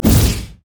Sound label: baleful strike